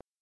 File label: Instrument